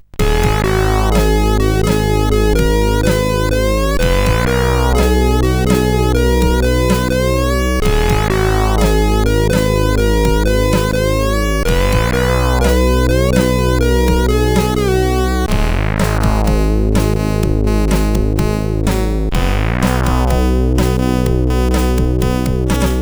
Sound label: Disco Guitar, CSG8580R5